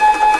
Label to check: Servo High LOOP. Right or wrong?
Right